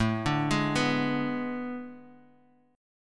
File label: A Major 7th Suspended 2nd Suspended 4th, Guitar, Open F; AM7sus2sus4; arpeggiated; 9,9,8,6,x,x